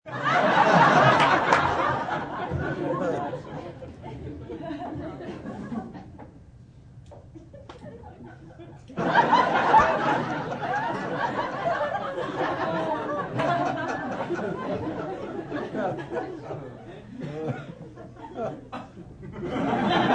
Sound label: Audience Laugh, Laughing, hearty, live ambience, comedy club audience of 30-40 laughing, long; human voice; Laughter; VoiceBox